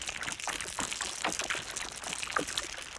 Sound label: Ice Cell Squidge; boomerang fu